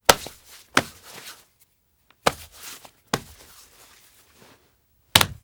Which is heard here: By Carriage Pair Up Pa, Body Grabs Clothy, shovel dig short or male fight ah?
Body Grabs Clothy